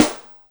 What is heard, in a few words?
Maple 12, Acoustic Snare, Yamaha DTXpress